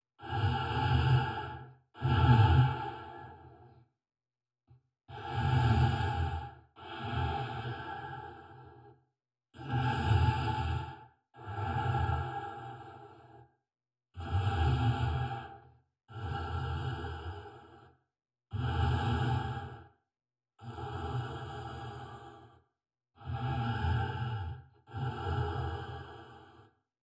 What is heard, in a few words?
breath; Darth vader